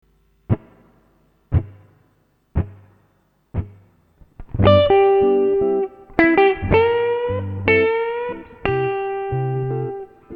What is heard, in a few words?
Lick